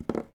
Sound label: Tap Fingernails On Desk; SFX Kit; Foley - Misc